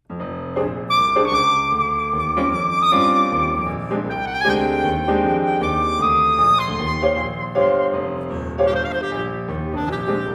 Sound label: Clarinet Sonata